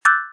ball Match; merge-dragons-2

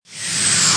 end whoosh; merge-dragons-2

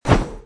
summon Fog; merge-dragons-2

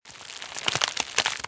path seal; merge-dragons-2

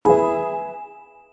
rainbow Match; merge-dragons-2

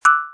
ball Match; merge-dragons-2

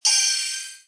stardust HUD; merge-dragons-2

